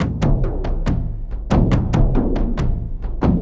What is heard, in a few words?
Boompa Dooga Fwum, Frame Kendang drums; Sonic Foundry (Sony Creative Software) - Friendly Fire; Long